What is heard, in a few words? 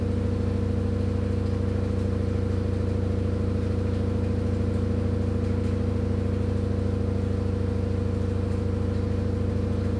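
Spinning Normal RPM, lavadora samsung; minha lavadora samsung esta fazendo barulho; home-appliances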